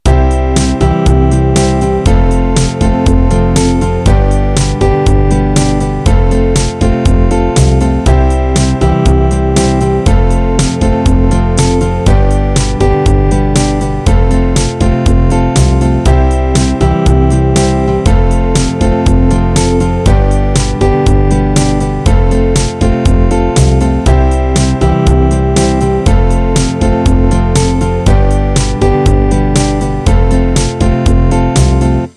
C F G F

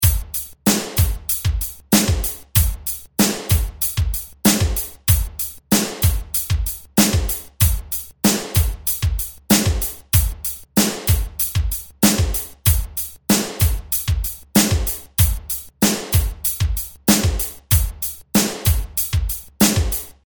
Hip Hop, 95

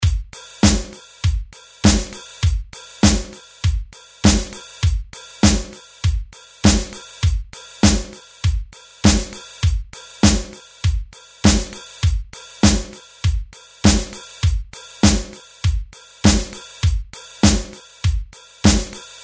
dance, 120

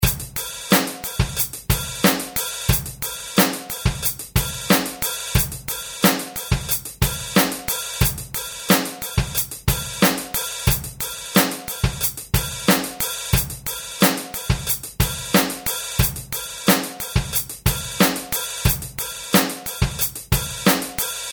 heavy, 90 bpm

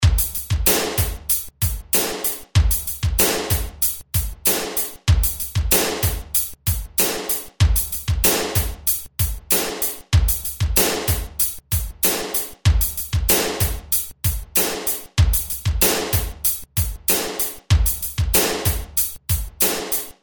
Hip Hop, 95

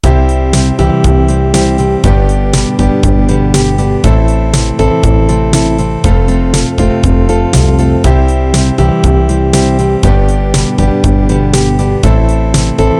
C F Am G

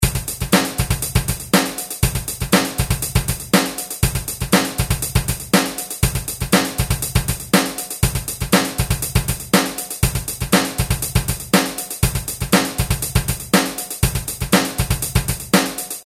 pop, 120 bpm

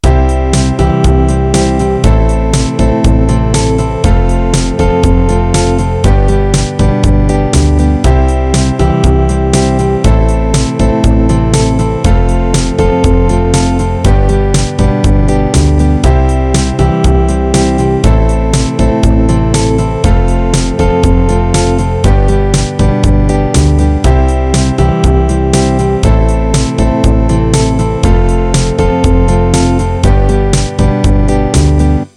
C Am F G

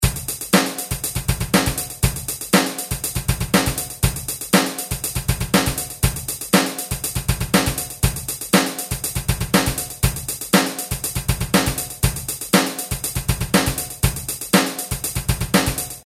pop, 120 bpm

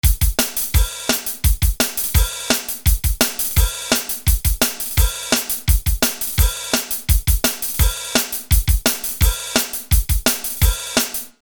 Punk

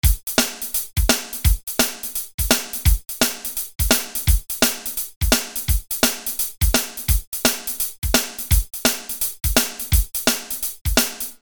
Punk

